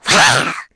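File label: Vox Attack, Korean; Nia; kingsraid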